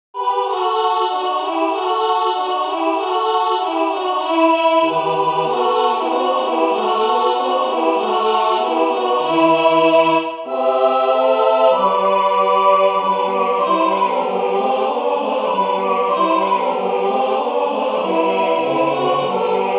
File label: Kalinka tutti